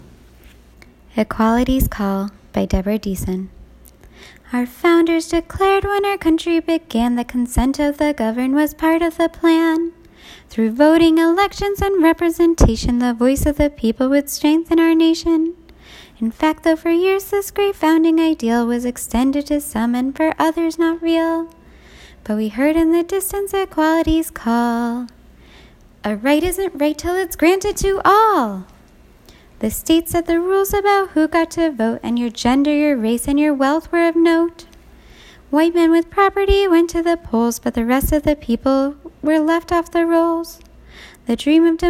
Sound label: Singing example